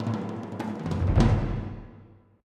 music interactive; World of Tanks

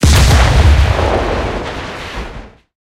tank aphe explosion; tanks; War Thunder

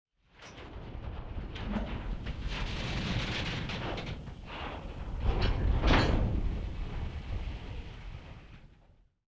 hangar layer; War Thunder

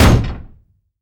Aircrafts Uh-1H Pre Mast Bumping; DCS

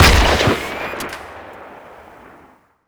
imperial sniper near

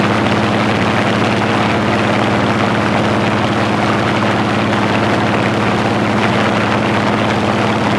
engine ai plane rpm 99 mid; War Thunder; engines

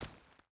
footstep barefoot stones run; Rust

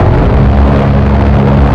Weapons GAU8 Cannon In; DCS

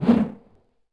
Swoosh Weapon; Civ4 warlords

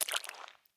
walk puddle; Escape from Tarkov

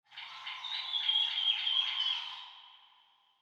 ambient tropics birds

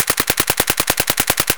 akm distant silenced; loop; Escape from Tarkov

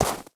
horse footstep snow hard; Rust

